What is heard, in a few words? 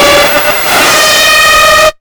NUCLEAR, Hard & Loud Techno; USB Soundscan vol.01; AKAI